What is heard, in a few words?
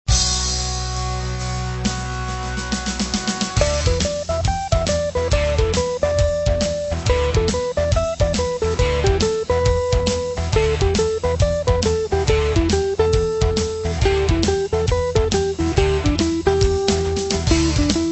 Desc; Penta Blues Patterns